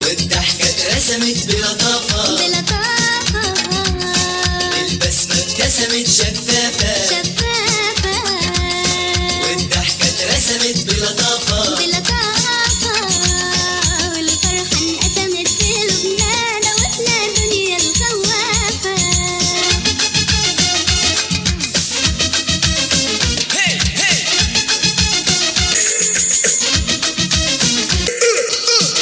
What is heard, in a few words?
Arabic